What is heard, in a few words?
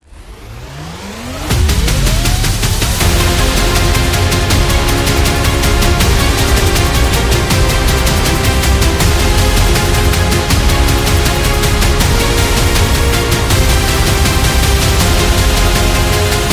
News Intro